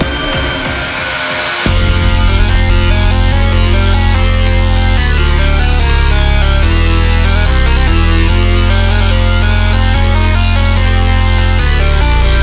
Easy Listening Bass Music; Touhou; 東方紅楼夢19

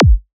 Kick

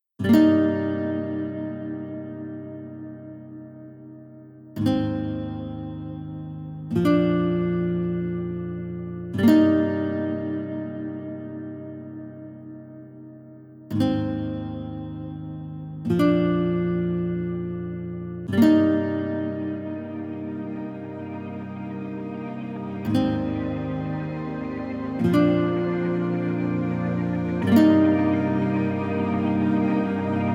oscillation

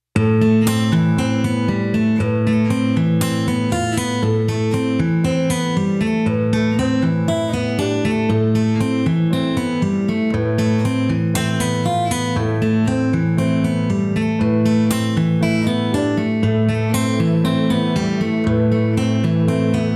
Ambient Dark (Instrumental)

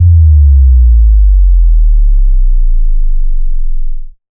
Sub Drop

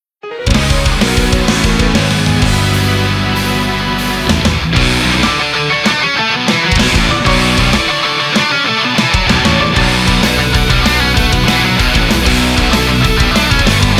off vocal version

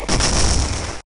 burst

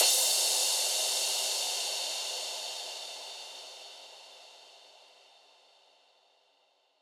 Crash

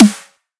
Dubstep Snare

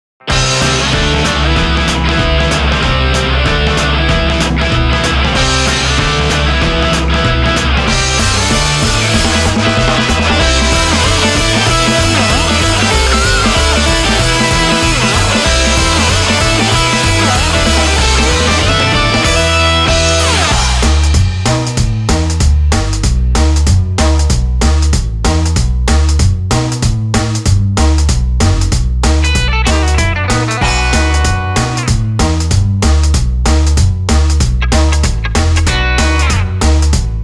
karaoke ver

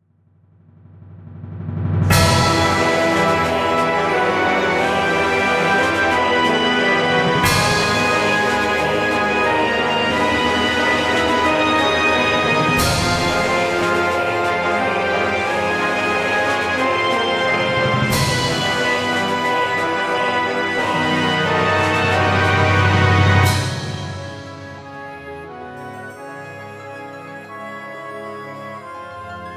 instrumental

